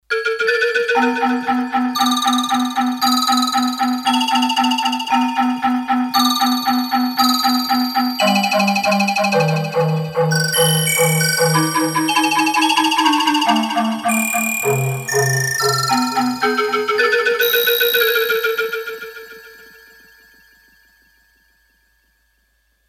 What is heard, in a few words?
Jungle Tune, Roland D-10; Factory Patches